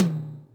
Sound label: Yamaha RM 50 Toms